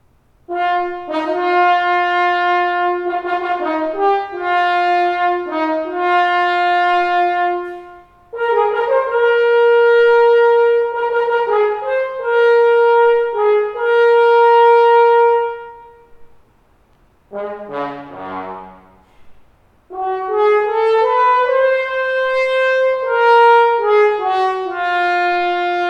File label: spun bell excerpt